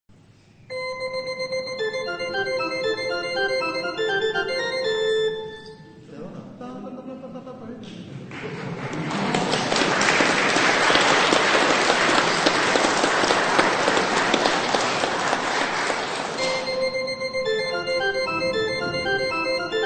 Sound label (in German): fuga f dur